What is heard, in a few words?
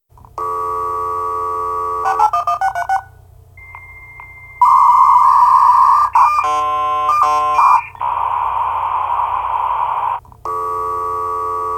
Modem